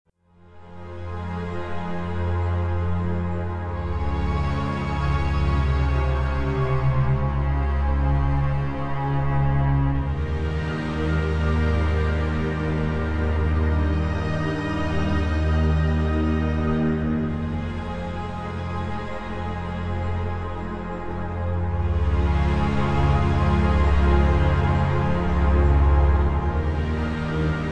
The music you will play on this page is called a loop. The music is designed to loop back to the beginning as one continues; On-Hold Audio System message; soothing